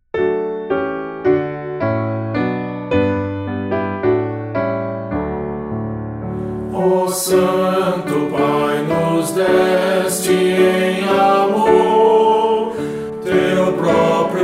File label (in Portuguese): voz piano